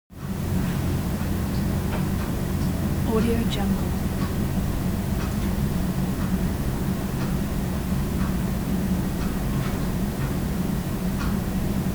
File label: atmo room tone kitchen; domestic sounds